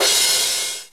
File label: cymbal